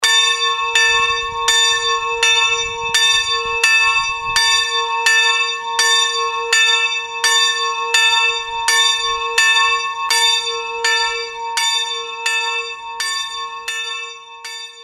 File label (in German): Glocken; egelsbach-erzhausen; Maria Koenigin